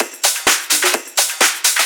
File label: Discohouse Loop 128 BPM